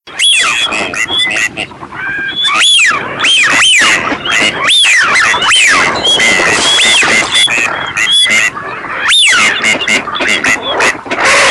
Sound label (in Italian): fischione canapiglia